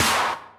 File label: soft hit finish; Osu!